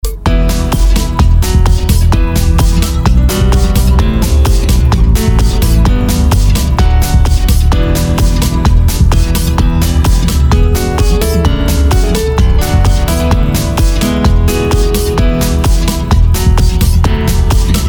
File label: ritm